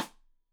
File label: Side Stick Stereo; Deeply Sampled Drums; SMDrum